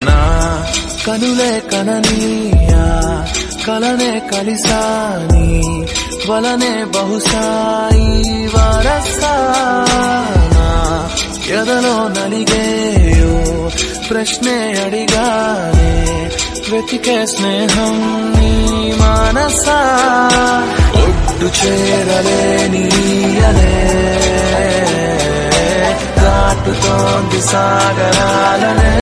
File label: songs ringtone; krishnarjuna yuddham